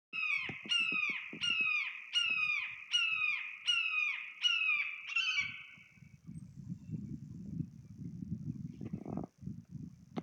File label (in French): Buse a epaulettes; Thomas Wood